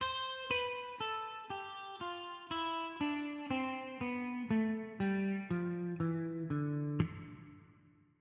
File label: Sometimes Muted Guitar